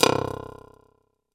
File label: ATTACK, ALIVE INSTR; Soundengine - CSR-000 The Art of Transwaves